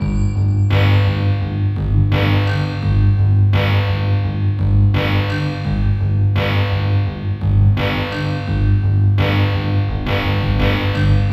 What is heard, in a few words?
Robo Beat, Percussion